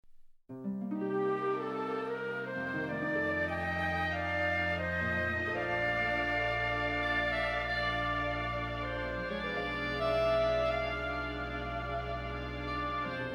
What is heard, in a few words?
Clarinet Jazz